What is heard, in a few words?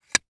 Sports Pole Plastic Tent Poles Connecting